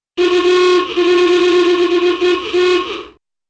rapid awoogahs

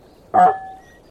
honk glass

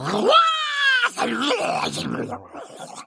male murloc play

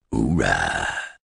deep uuraah

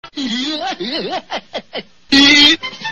hihiheeihihiheiei hihih

